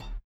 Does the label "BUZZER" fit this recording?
Yes